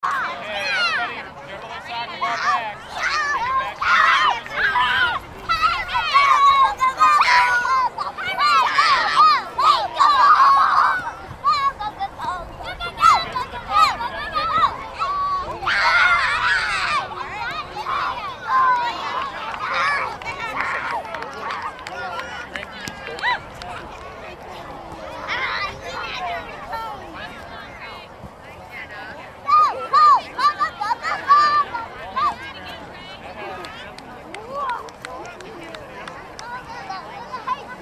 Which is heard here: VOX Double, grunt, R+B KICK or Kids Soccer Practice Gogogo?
Kids Soccer Practice Gogogo